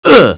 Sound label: grunt; beavis and butt head